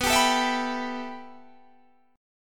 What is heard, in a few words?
B 7th Suspended 4th Sharp 5th B down; B7sus4#5; strummed